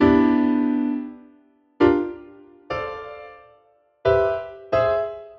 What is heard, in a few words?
c major 7 jazz piano loop part a 89 bpm